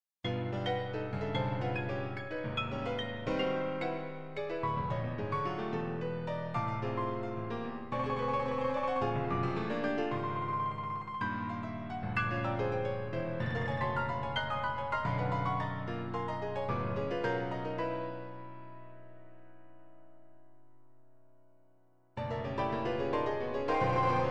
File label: piano